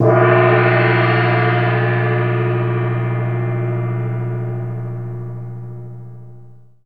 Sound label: Gong Small; mf